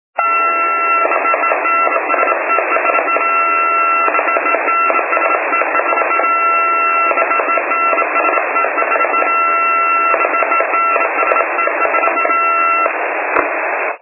147mhz phone

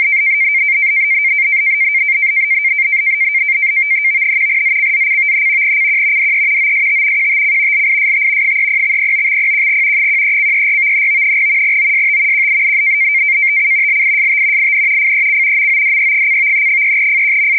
sitor b 100baud fec

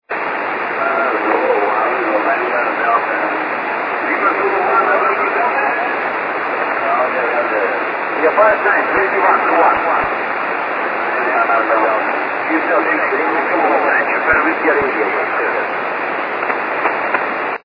echo

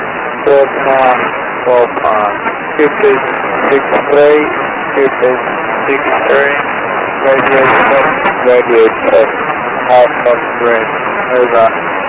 7630 slow

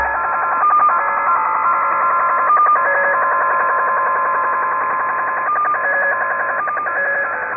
8588 kHz

